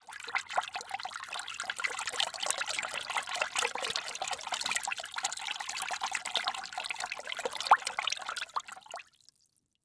Liquid Water Movement In Plastic Bucket Hands Splashing Constant